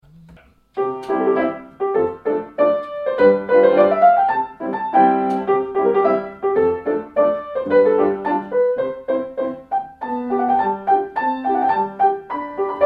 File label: Soiree Polka